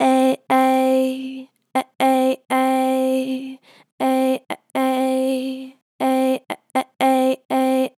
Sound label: Eh Eh, Natural Vibrato Strong, Vocals; Harmor Vocals